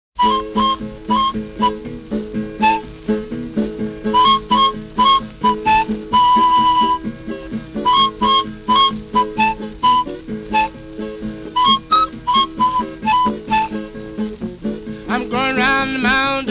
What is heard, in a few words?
Flutes de Pan Quills